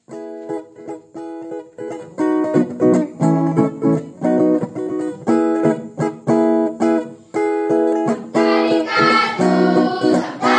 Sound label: singing to god; guajajara; wycliffe bible translators inc nt drama